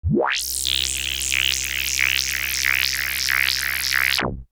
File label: Random Sound; Europa